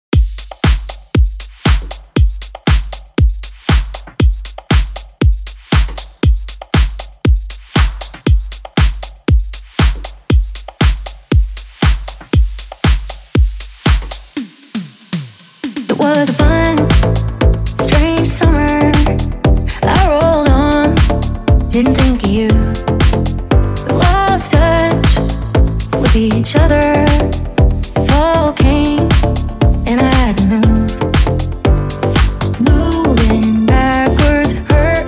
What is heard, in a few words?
bpm 118